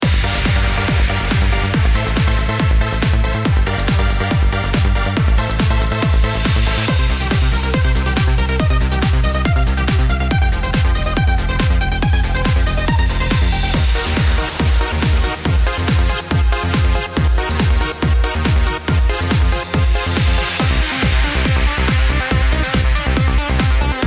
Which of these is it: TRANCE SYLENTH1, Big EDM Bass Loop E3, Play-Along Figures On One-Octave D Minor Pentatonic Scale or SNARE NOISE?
TRANCE SYLENTH1